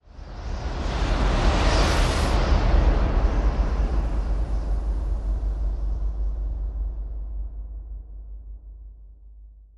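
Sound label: Whoosh Crashing Wave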